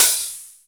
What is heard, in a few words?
Open HH, Cymbals